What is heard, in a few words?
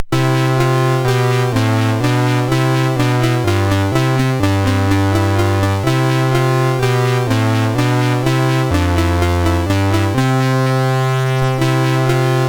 Grfx Jump, MOS6581R3